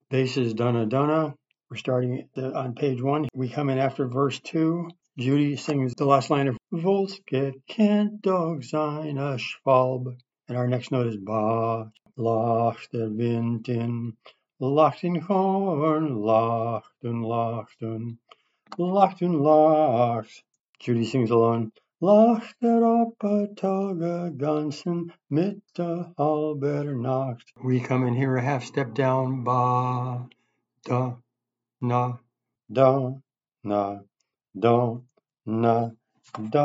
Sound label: bass